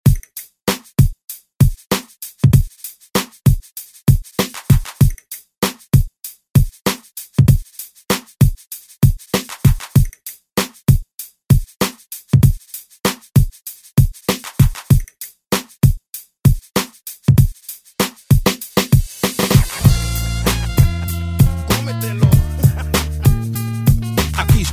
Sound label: Spanish ReDrum; DJ Mhark